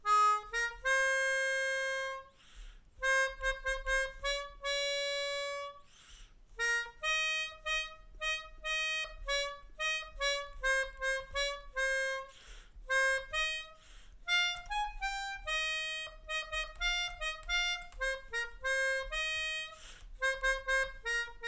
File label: Melodica